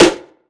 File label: steel snare drum f key; snare drum samples